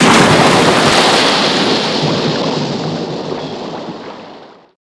mortar impact water; Explosions; Call of Duty Modern Warfare

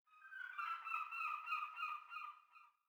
sting gulls; Rust

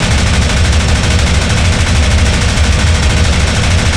P-51D Cockpit Browning; DCS

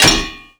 connect metal

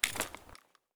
pm mag pull in; Escape from Tarkov

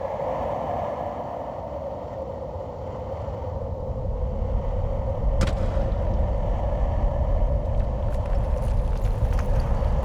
ambience cave

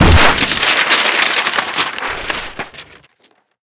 paper hit, Explosions

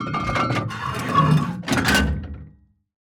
gun reload; World of Tanks

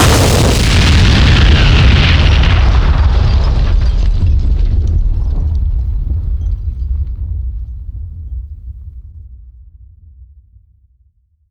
Explosions Explode Ground; DCS

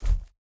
bear footstep grass hard; Rust

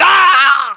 death; ModernWarfare; localized english

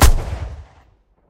m1919 lastshot right, guns

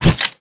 melee swing; weapons; Call of Duty Modern Warfare

